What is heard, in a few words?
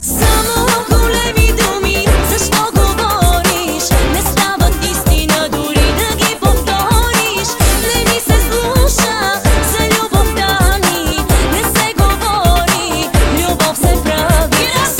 Acoustic